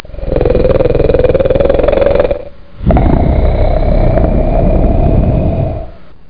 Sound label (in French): Ronflos; Interactive Cartoon Strip!; Resources à la Française